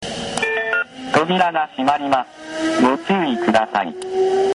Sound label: melody; shinmatsuda4